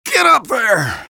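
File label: engineer taunt flip exert; vo; taunts; tf2; engy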